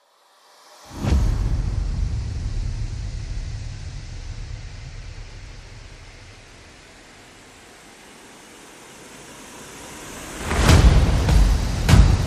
Percussion and FX

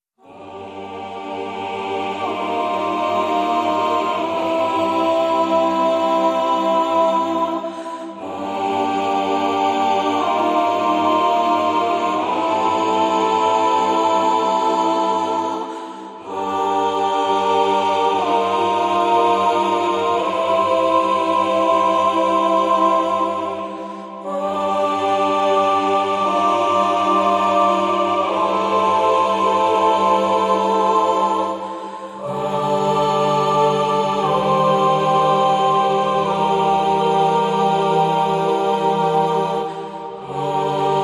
Choir Stem

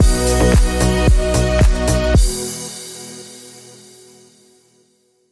Bumper

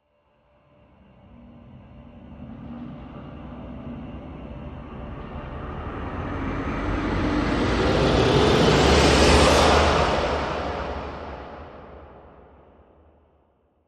Predator Apocalypse Rise

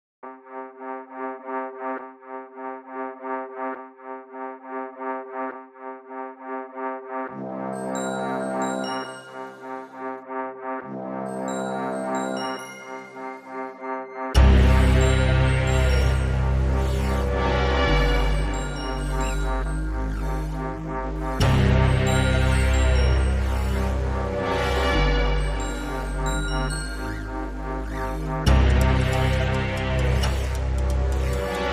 Escape No Flute Sample

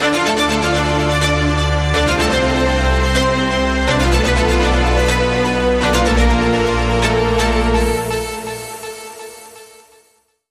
Link Sting

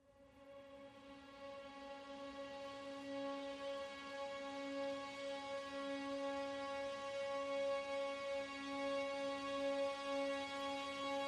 Strings Only

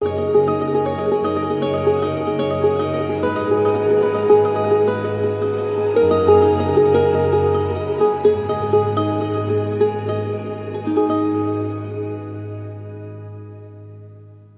Charango

